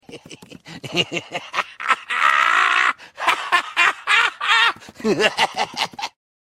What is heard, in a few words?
Laughing puppet meme